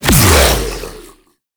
Regurgitate Impact C; Warframe; Grendel

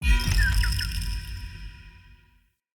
Bird Feeder Pickup; Objects; Thawtide

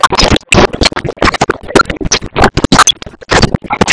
Ambience Thunder; Cinematics; Warsongs; Warsong Prologue

